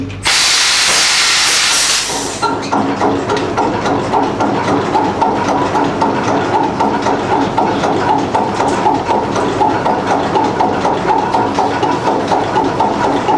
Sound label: motor start